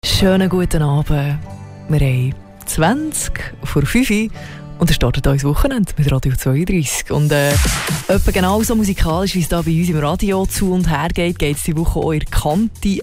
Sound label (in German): Orchester Chor; musikwoche musical flug in die zukunft; Radio32